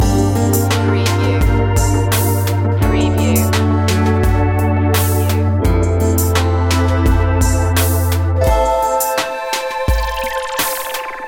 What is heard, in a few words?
soft plug in loop